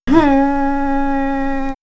Ethno Vox Malawi; vox mix; X-Static Goldmine

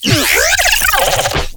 glitch stab; Virtual Riot; Glitch Stabs

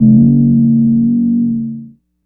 Moog one shot, Synths; Sonic Foundry (Sony Creative Software); Electronic Point Blank

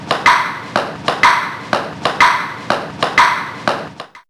Ping Pong, Fairlight CMI IIe

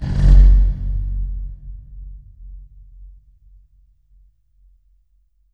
Pipe Rub; Effects

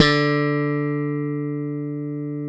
POP BASS, Electric Basses; PlatinumPhattCD2; E-MU Producer Series Vol. 8 – Platinum Phatt

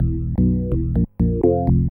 ORGN LICK, K E Y S

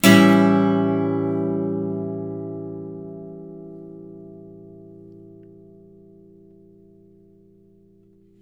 Blues Guitar, chords; ILIO; Fretworks

